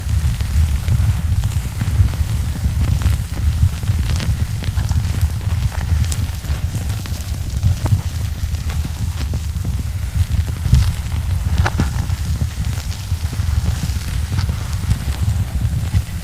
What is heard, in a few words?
towers destroyed loop; LoL